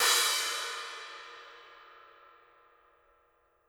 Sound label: Trash